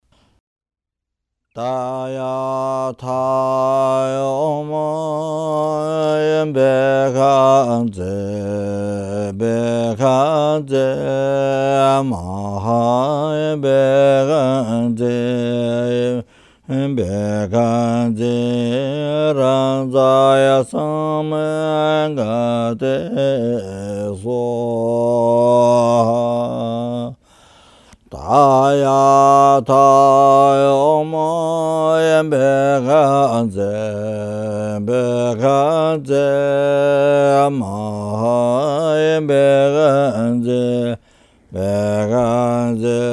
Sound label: Medicine Buddha Mantra